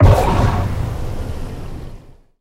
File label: torpedo impact; War Thunder